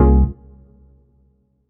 Dance Mega Synth; Best Service; 90 s Sample CDs

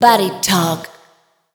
PHRASE, Voice Spectral

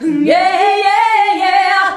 VOX, Singin

